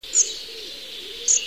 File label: muscicapa striata